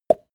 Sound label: plop; soundpool